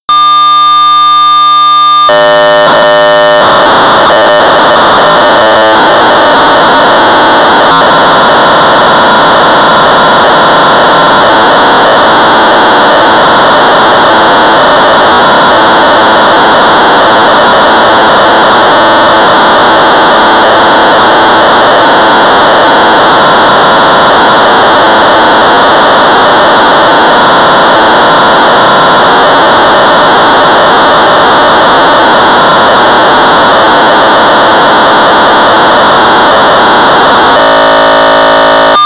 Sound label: Sorcerer 1200 baud; wordsquares; BASIC